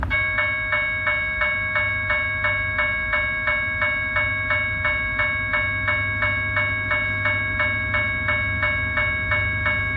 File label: Early GS, Electronic, General Signals